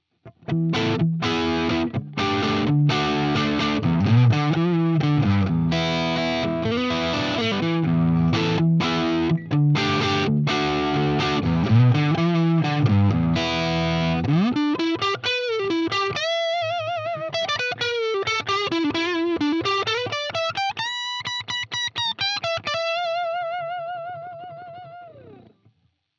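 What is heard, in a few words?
Harley Benton TE 90FLT neck distortion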